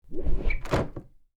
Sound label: door close